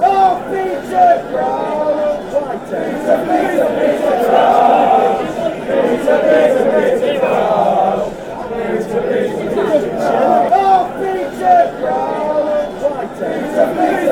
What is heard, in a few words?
oh peter crouch